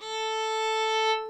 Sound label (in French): violon La3